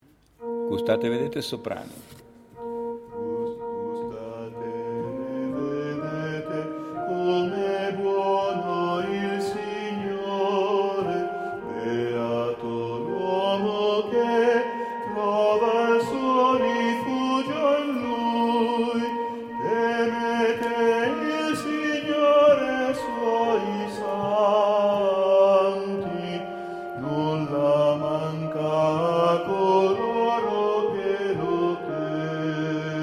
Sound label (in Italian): Gustate e vedete Sop Voc, Coro; Visita Arcivescovo